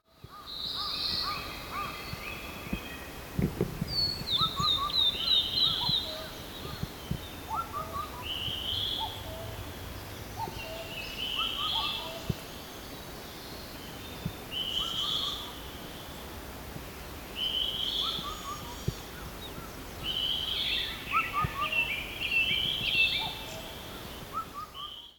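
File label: kakko, cuckoo; bird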